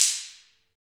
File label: Ambient Stix, Afro Wood; Roland LCDP14 Africa VOL-2